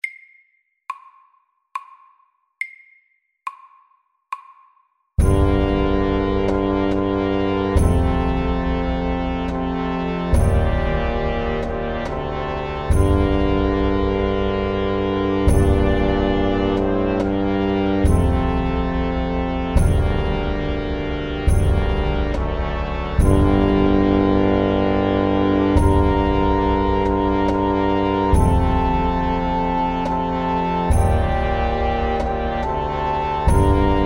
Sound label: Hemiola SLOW